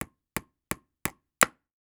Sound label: Industry Tool Hammer Hitting Nail Into Wood